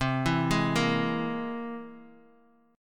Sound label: C Augmented 7th, Bass Standard, chord; arpeggiated; 20,19,18,15; JGuitar